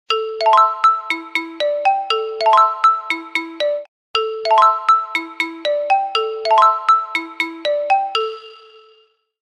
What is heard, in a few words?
Woodring, ringtones; Doov A8